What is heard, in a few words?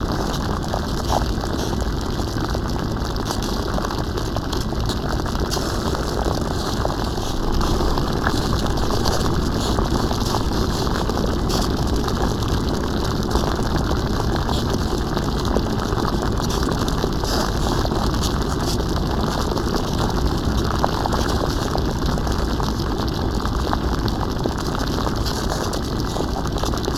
Boil